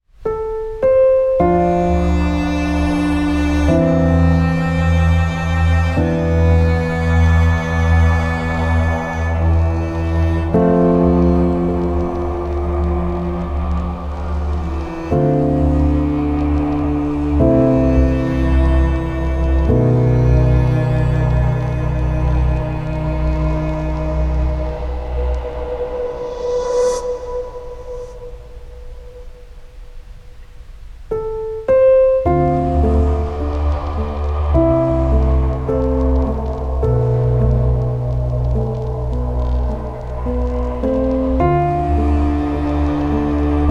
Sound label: Dark Solo